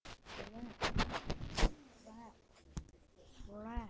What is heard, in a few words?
rat